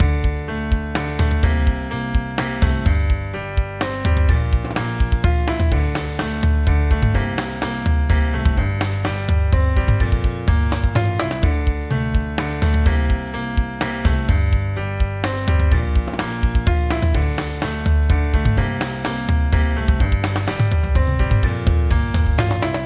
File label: Progressive Rock; 明石明楽